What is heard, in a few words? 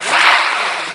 parasite fly; garrysmod